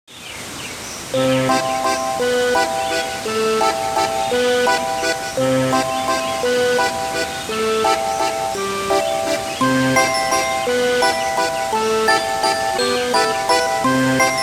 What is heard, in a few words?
Mini-Game Land; Mario Party 2; N64